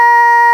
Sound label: TENOR, CHORAL; FAIRLIGHT CMI IIX